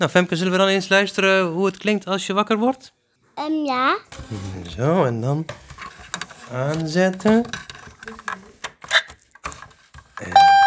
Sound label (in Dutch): klokspaarpot; Oude Winnie de Poeh